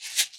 Plastic Bead Shekere; World Percussion; Cymatics; Emperor World Percussion

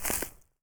Footstep Grass Light; Footsteps

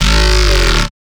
Bass One Shot - G#, Dubstep; Synths; One Shots; Cymatics; Dubstep Starter Pack

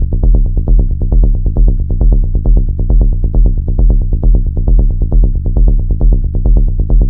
Bass Loop E 135, Techno; Ghosthack; AC2024 - Day 1 - Techno Sounds 2025

